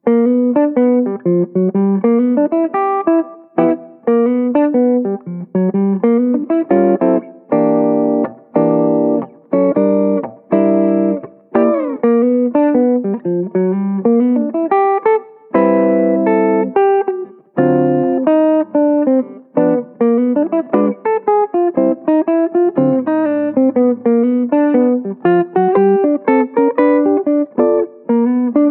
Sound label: jazz blues comping study in g, guitar; Extended Chords, Melodic Lines, Chord Substitutions; Jazz Blues Guitar Study in G - Mini Lesson (ML12)